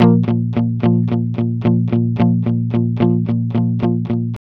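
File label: ROCK B flat 110, Vintage Blues Guitar; USB Soundscan vol.22; AKAI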